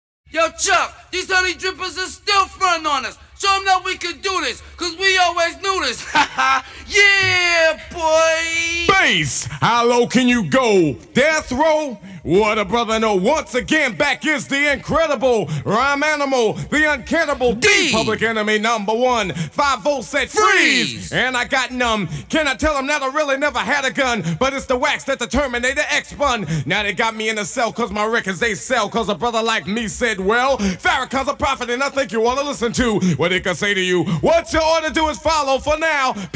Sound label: a cappella